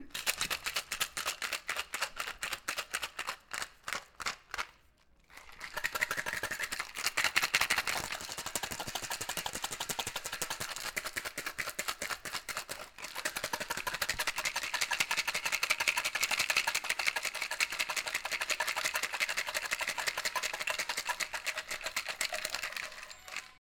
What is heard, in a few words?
Shaking Martini